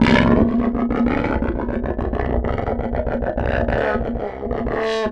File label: LAUGHS, HORROR; AKAI Anime Sound FX